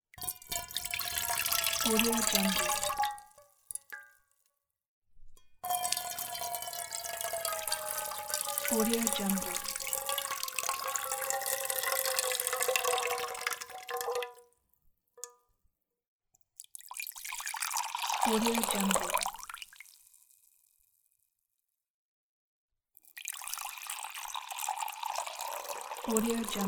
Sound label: pouring water in a mug bottle pot